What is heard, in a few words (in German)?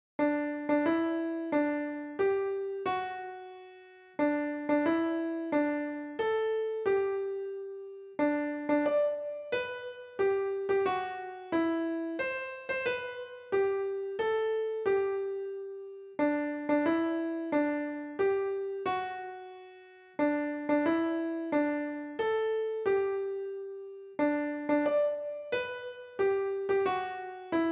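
Happy Birthday to You Klavier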